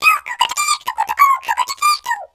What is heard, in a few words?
Creature; Orphaned